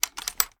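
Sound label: foley reload loop load; weapons; gmod; viper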